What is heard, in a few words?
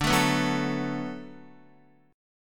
D 6th D down Guitar, chord; D6 strummed; tuning 4E,4A,5D,5G,5C,6F